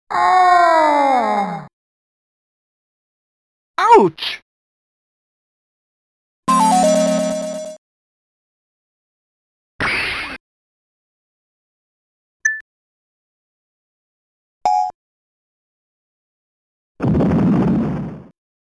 VOICE 1, VOICE 2, CREDIT, SHOT, SIGHT, REBOUND, EXPLOSION; yu suzuki produce hang on space harrier